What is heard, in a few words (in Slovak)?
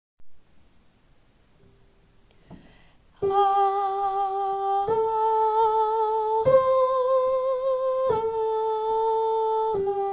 sopran; Chlapcek sa nam narodil